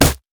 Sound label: blue minion melee basic attack; LoL